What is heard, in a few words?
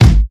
loud kick drum sample G sharp key